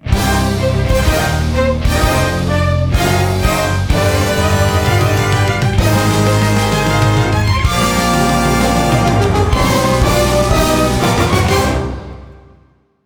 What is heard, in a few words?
Minor Win Full Fanfare; Regalia of Men and Monarchs